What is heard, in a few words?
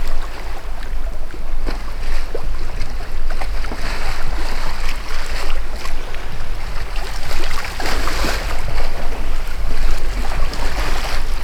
water sloshing loop